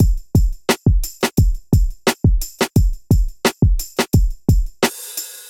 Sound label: Kit, Hip-Hop Drums; Golden Era; Ableton; Sound Oracle